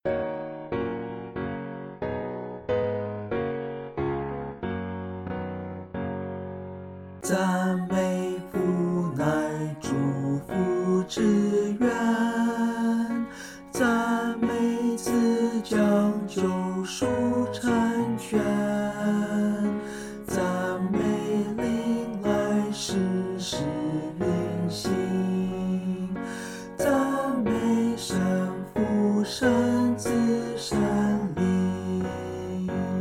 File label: vocal; Singing; Hymns; Chinese